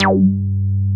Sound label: Moog G2, analog bass; Music Store - Claudius Diemeter Schwerlos